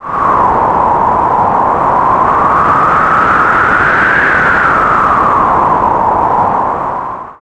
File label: tornado